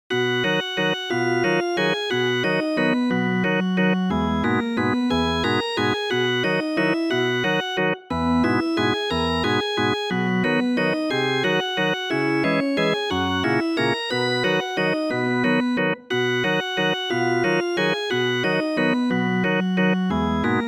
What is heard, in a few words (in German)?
drehorgelwalzer; Bernd und das Rätsel um Unteraltbach; unteralterbacher